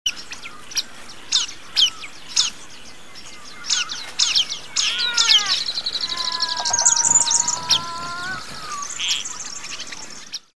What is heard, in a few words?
Dusk